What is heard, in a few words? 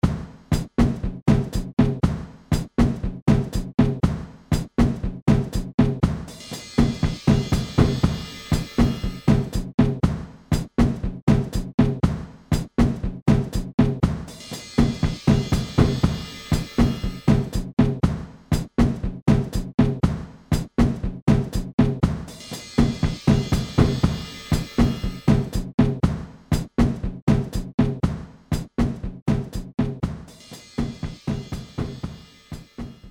Yamaha 9000 tape drum rack